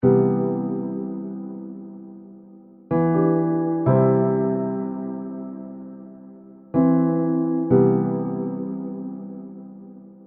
Piano Ambient; SafeMusicList

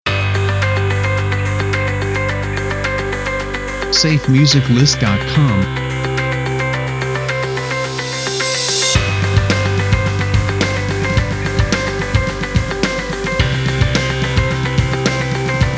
Positive Message; SafeMusicList

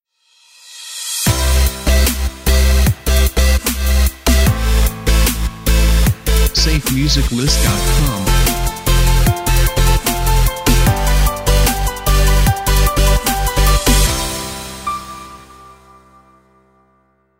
Inspired By Sunset Logo; SafeMusicList

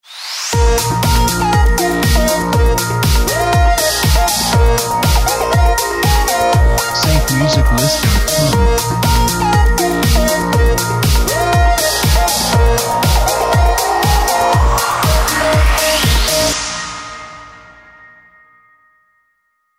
High On Tech Intros; SafeMusicList

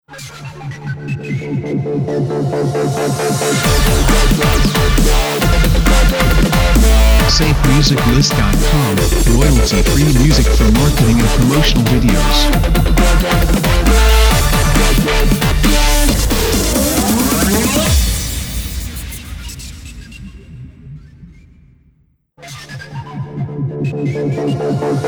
Power Breaks Intro; Extended; SafeMusicList

